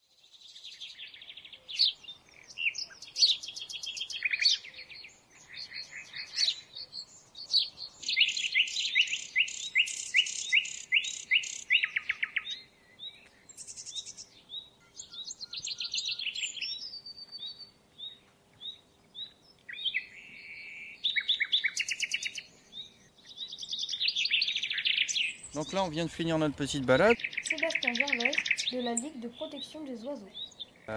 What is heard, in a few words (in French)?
les oiseaux de Pont Pean